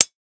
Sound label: Steel; WoWInterface; Plater